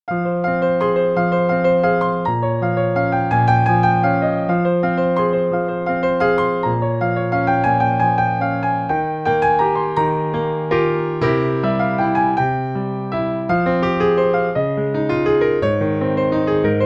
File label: Waltz in E Minor